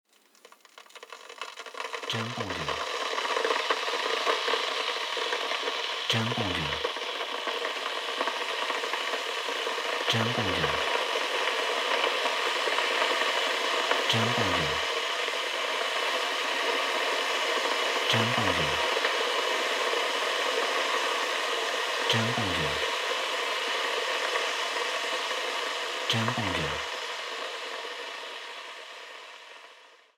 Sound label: Boiling Kettle Short